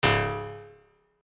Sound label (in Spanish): Fallar Disparo; SFX Gameplay 3